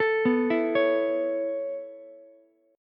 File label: B 9th Suspended 4th B down Ukulele C6; B9sus4 arpeggiated; chord shape; JGuitar